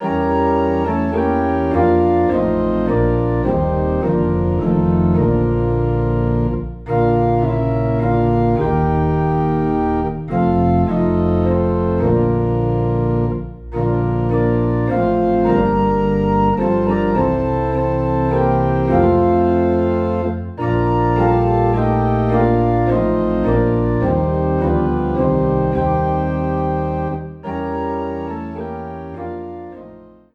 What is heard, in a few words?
Organ Low in B Flat